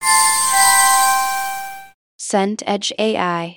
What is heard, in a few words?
chord success major